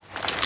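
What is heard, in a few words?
weapon foley mp9 reload lift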